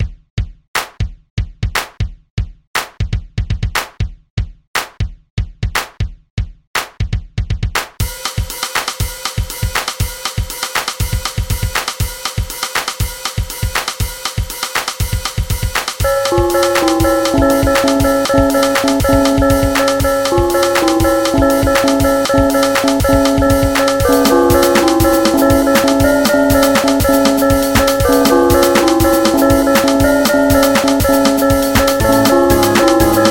intro horror

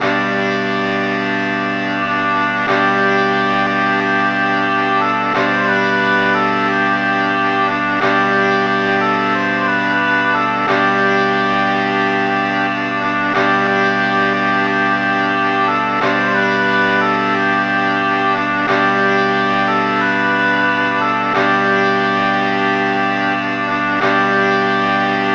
Breathe Bagpipes

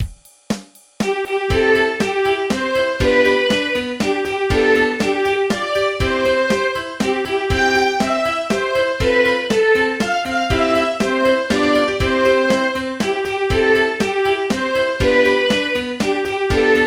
Happy Birthday To You

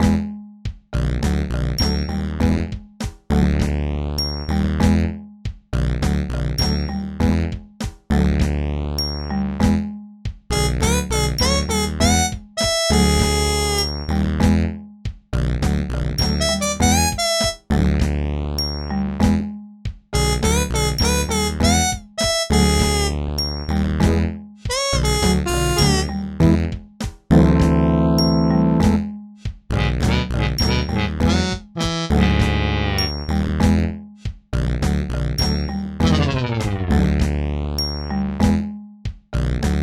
Background Music; Commander Keen

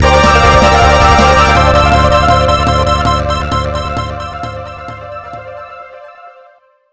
Game Over

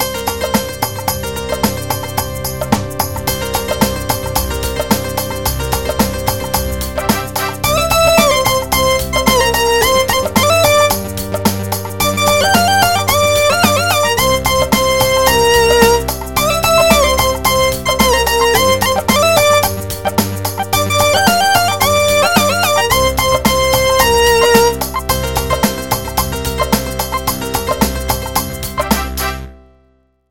Punjabi beat

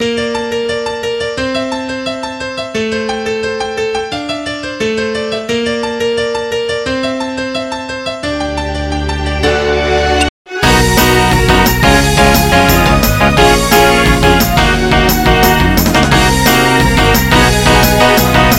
Instrumental

